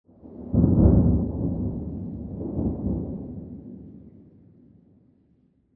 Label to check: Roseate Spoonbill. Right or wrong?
Wrong